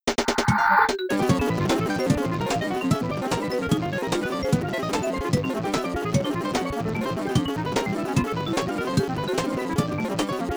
Main Menu Noise; Mario Artist; Polygon Studio; N64

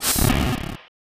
Fire

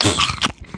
Chomp

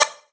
MENU MOVE

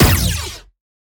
Sciweap Shot Small Blaster Energy Thrower

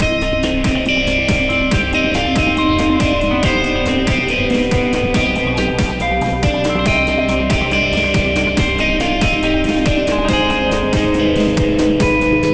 Mini Game Urgent; house m.d.; nds

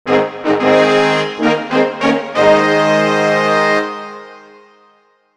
Player Fanfare; Pokemon Battle Revolution; wii

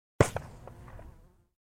long musket shot with barrel

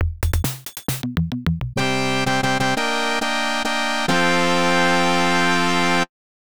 Last Bonus Stage Complete; paperboy; arcade; Bridge 2

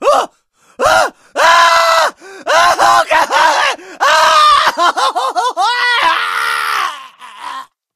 Scream Agony Male; friday the 13th killer puzzle

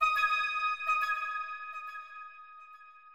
Musician Rebloom Flute

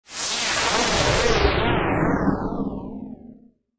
Alien Woosh game over; Zotrix

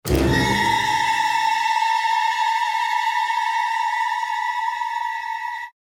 Transitions High Brass; the prometheus secret noohra; Elvin

